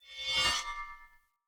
Sphere Polearm Whoosh Accent Heavy; Weapons; Player

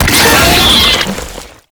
Pain, Vocals; Enemies; Warframe; Proto Infested; Brachiolyst

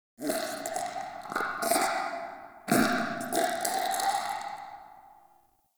Interior Goop Distant, Infested, Ambience; Misc Indoor

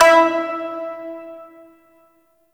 Shawzin Oct Two E; Warframe

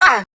Pain, Grineer Queens Guards; Battle Barks; Warframe

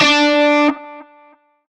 Shawzin Oct Three D; Music; Warframe; Narmer Shawzin

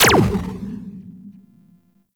Laser, Weapons; Crewman Laser

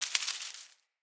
Wetland Forest Day Vegetation Scurry; Midrath Island; Ambience

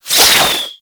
Projectile Whiz By; Ultimate Abilities; Madurai; Warframe; Focus

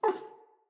Wolf Cub Trapped Call Close; Vocals; Animals

